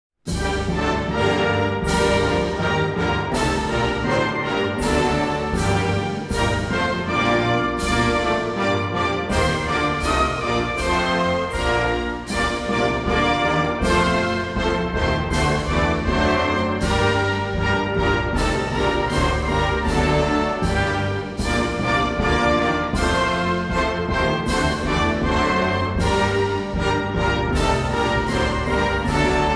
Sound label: National Anthem of Slovakia; Slovensko